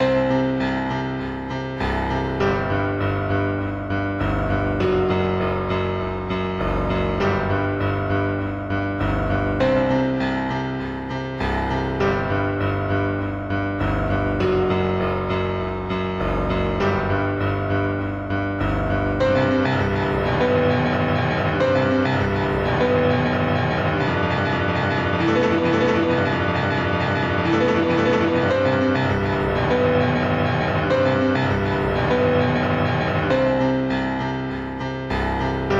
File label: guitar